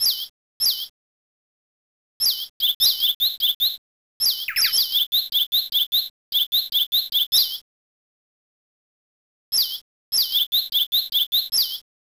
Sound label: Unused Birdchirp; Inherit the Earth; amiga